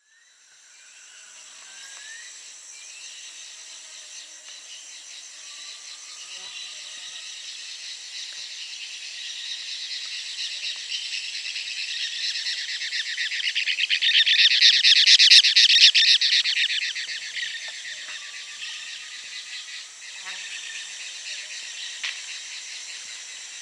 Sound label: Cobalt-winged Parakeet (Brotogeris cyanoptera), Peru; S. America